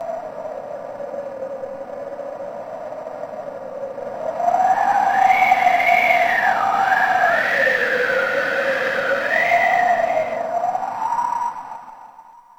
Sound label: Sand Storm Wind